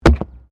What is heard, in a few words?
PLASTIC RUBBER PIPE; Material components for constructing more complex sounds; Plastic (Sound Effects:Materials)